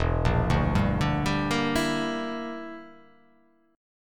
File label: E Minor 7th Flat 5th E